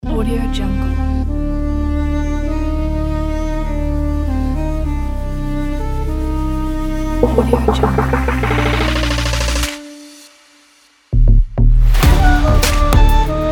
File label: arabian hiphop trap